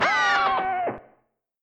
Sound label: Door access denied